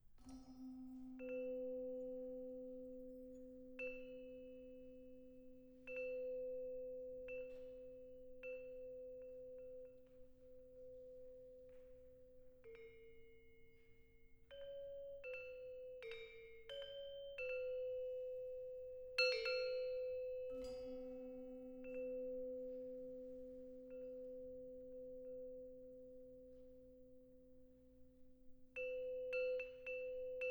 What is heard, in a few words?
Tabuh Telu Angklung; Recording 2.3. "Tabuh Telu," Introduction, played by the Eastman School's gamelan angklung, Lila Muni